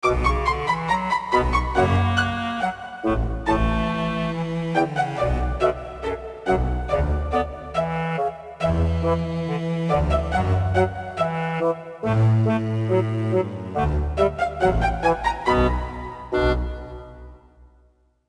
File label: Background Music